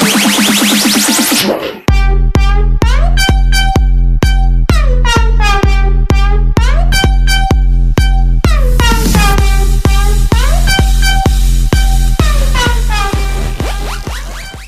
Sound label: electro; roundsound